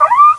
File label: sentry gun alert; cstrike; CSSB